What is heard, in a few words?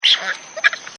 Monkey; Monkeys; Animals; Partners In Rhyme